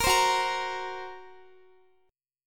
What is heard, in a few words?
F sharp Major Flat 5th F sharp Guitar; F#Mb5 strummed; x,x,20,17,18,17